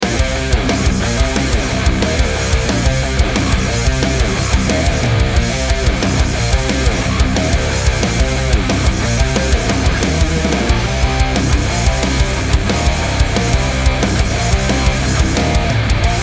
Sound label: workout metal 90 bpm short